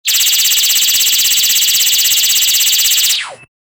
robotic laser gun tididid

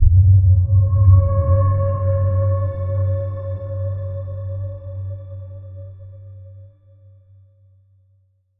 a brief tension filled; documentary

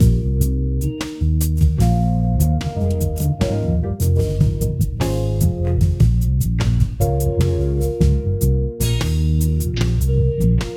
funky chill beat with smo

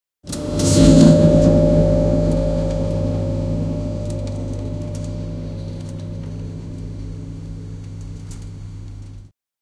electric piano sound

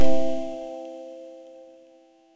ui, piano

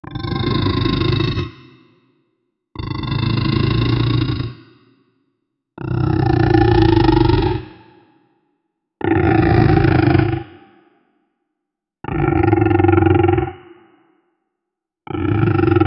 tiger voice sound, ferocious

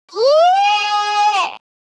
wilhelm scream funny, scream; sound effect

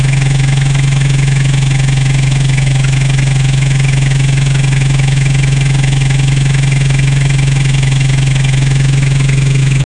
motorcycle engine sound; vehicle

